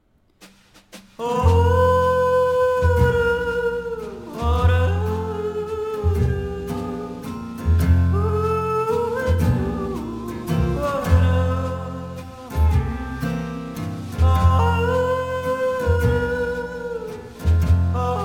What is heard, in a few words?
Waltz